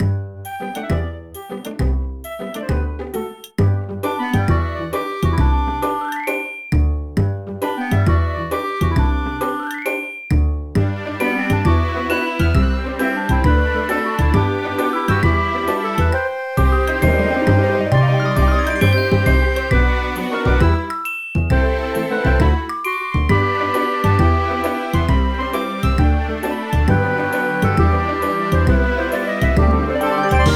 Musical ()

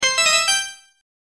Fanfare Comical Organ; Ranger

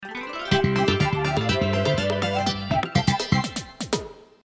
Jingle Clear; Picross

